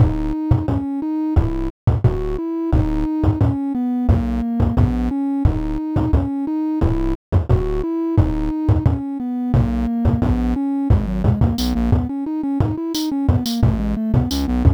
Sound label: Boss Music